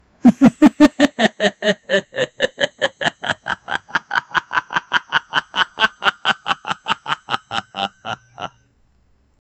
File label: funny evil laugh increasi